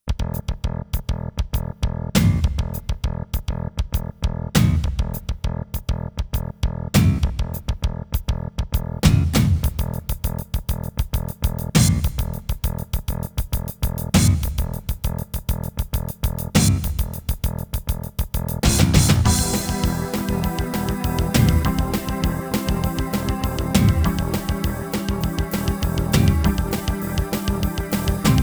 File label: GM SC55; doom2